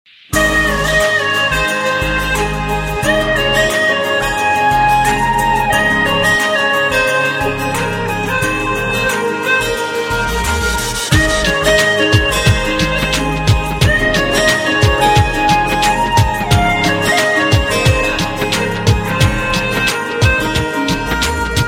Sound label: Music Rings; Rajneeti